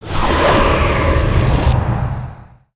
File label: Whirlwind Scythe